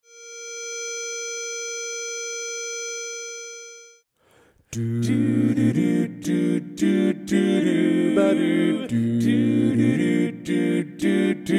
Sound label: Bass Left; Christmas Music